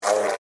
Hornet Land Short, Animal, Hornet, Land, Short, Fly, Wings, Buzz, Insect, Bug